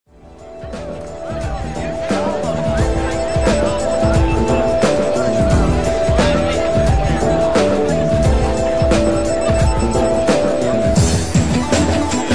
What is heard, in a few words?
clubbing